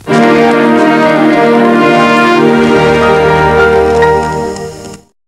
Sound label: Ident; CNNI; Trafalgar Square